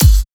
kick, drum hits; Anthemic

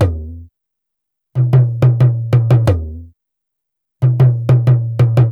ETHNO EXTRA 90; ethno2

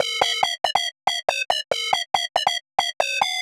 ELECTRO SYNTH 140 A; Keys

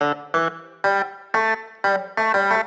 SYNTH 90 A; Keys

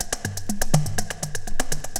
ELEKTRO, 122-146 BPM loop

